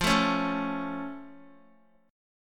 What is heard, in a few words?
F Augmented F down Guitar; strummed